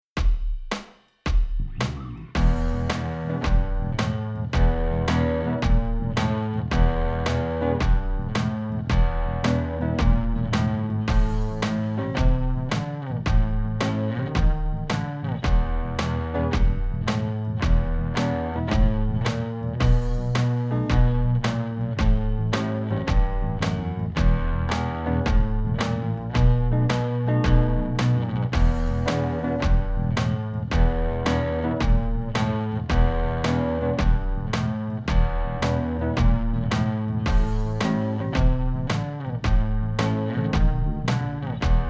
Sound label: Tamale Blues